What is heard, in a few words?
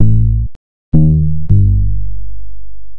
Bass, Futurist Drum & Bass